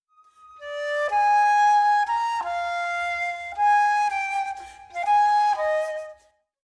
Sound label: flute